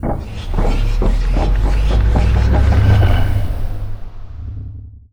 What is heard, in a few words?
scavenger bot attack main weapon windup; Stellaris; distant stars